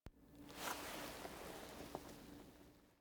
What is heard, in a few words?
Operator Foley Raise Scepter; The War Within